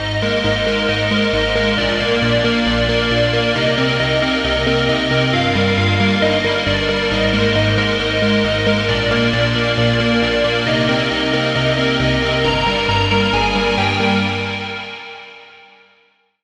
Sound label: Key; Routed Sound; Serum 2 Expansion - Reality Maker Vol.1